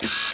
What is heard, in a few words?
droid servo